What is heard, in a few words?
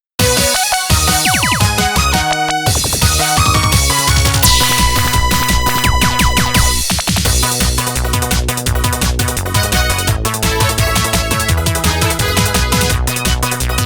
off vocal